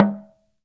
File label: TALK DRM, Heart of Africa; Spectrasonics